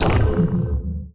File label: disruptor hit, weapons; Stellaris